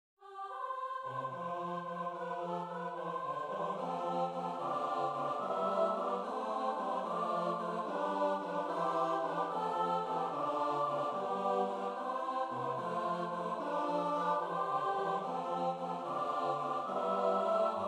trallekanon

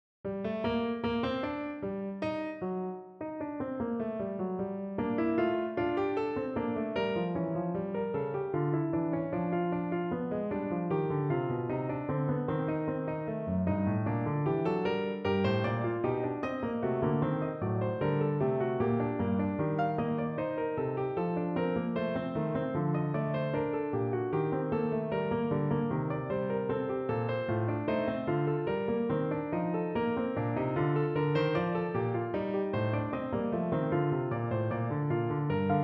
fuga i g-moll

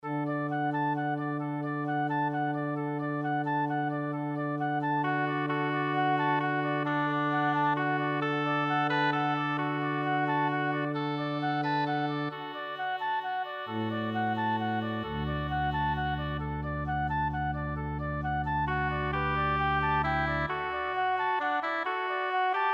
orgelkoral; Det kimer nu

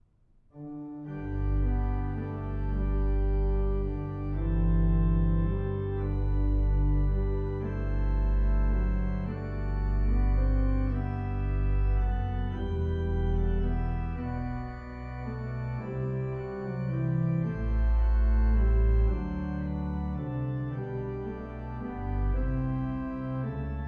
sats; Nu falmer skoven

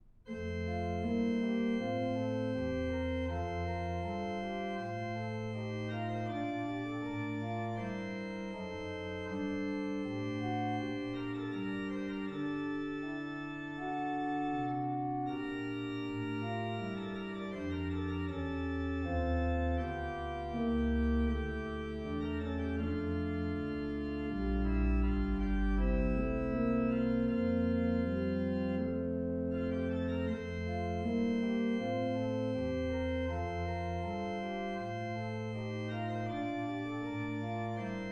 orgelkoral; en rose saa jeg skyde

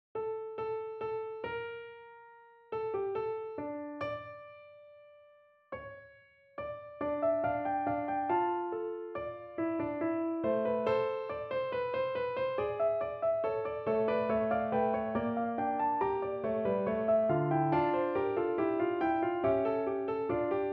fuga i d-moll

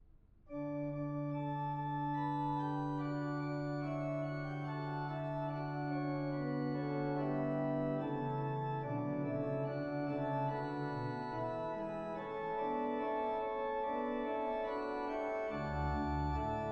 forspil; Alle mine kilder

